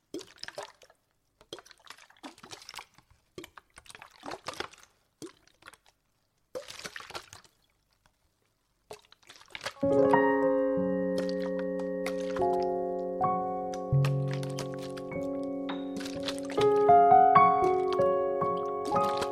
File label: little forest bgm